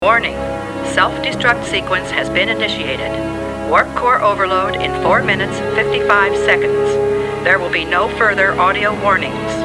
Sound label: alert; bsod virus